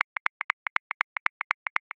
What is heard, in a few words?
Funk Late On The One 16ths; Grooves; Ableton Live 12 Lite; Core Library